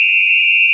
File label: Ball Goal; cstrike